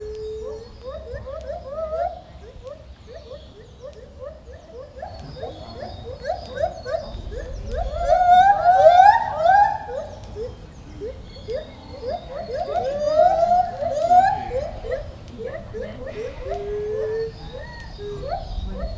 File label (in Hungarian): hangolás, egyszer pápaszemes pingvin is, fehérkezű gibbon; debreceni zoo 2012; marantz puska